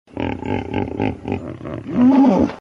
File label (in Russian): zvuk begemota